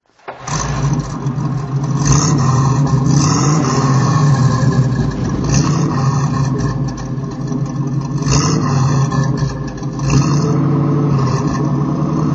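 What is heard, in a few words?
Speed Six special throttle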